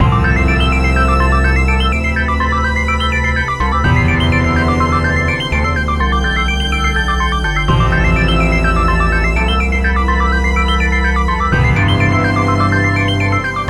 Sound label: space deadline bgm